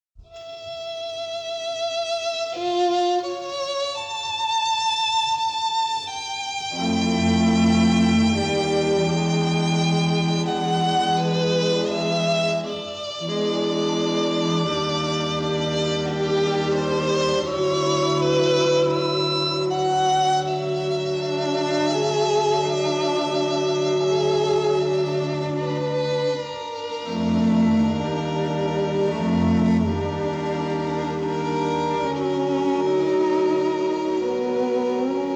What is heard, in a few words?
Weird Suspense